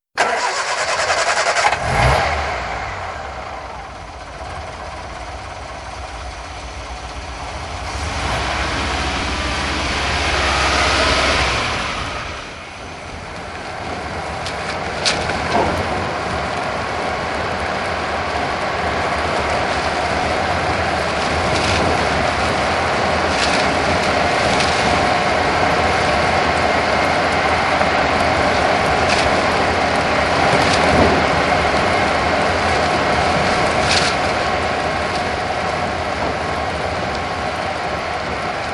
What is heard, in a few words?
British Lorry; 1950s; Working Life